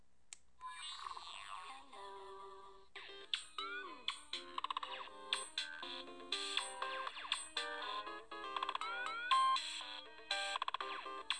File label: ambient alarm clock